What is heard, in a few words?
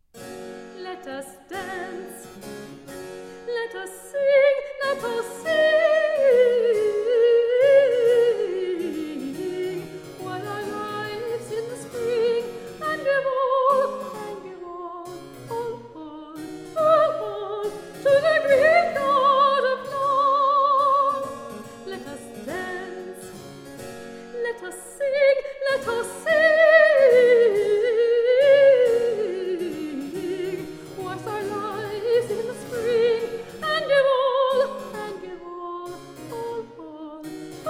aria for soprano; Let Us Dance; Prophetess; Henry Purcell